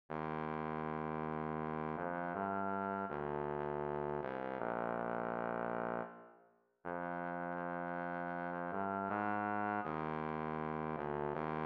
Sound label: Bass Trombone; Euphonium Solo – Humble on the surface, but hostile underneath.; Live performance